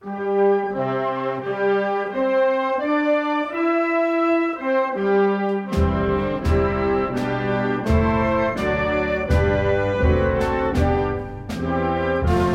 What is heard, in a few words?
anthem